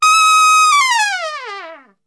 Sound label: D#4, Brass; Best Service; Brass Super Section; TRP LNGFLL